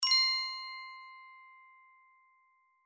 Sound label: New Idea Notification, bell pop up; Mountain Audio